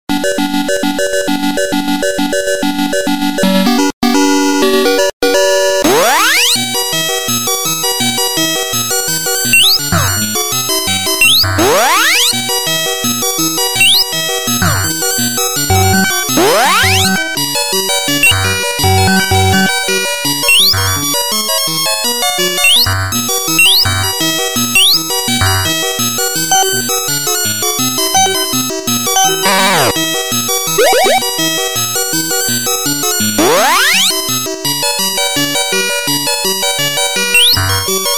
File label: ringtone; pengo